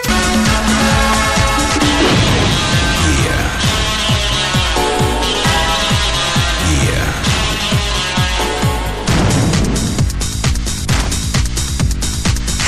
Instrumental Ringtone

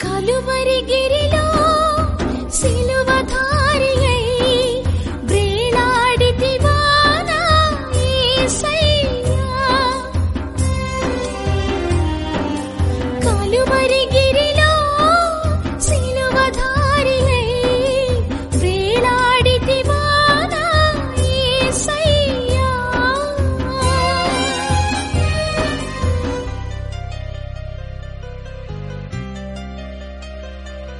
Telugu Christian

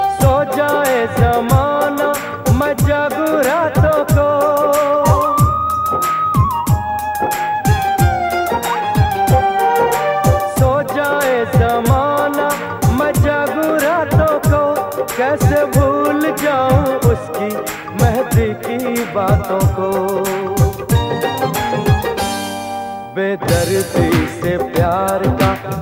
Sad Love Song

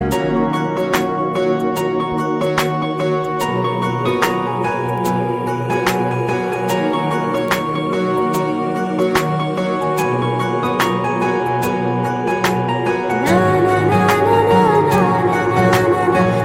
Instrumental Tone Ringtone

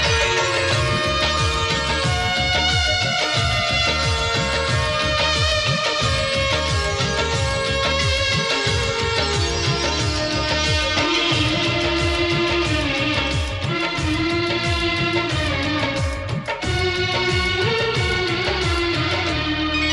Instrumental Ringtone

